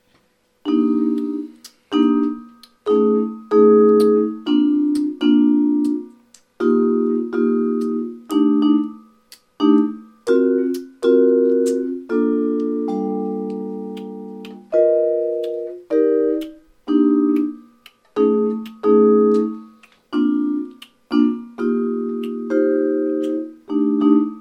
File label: ALTERED CLOSED POSITION, vibes